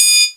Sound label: Triangle, Yamaha TG-33; Drum Samples